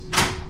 door Lock, Metro

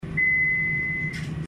bell, Metro

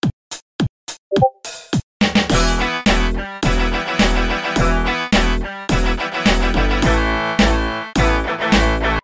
beluga metal troll

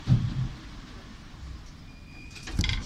stop, metro

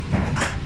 door Unlock, Metro

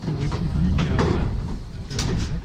door Close, Metro

